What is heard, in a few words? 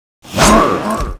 WAR ATTACK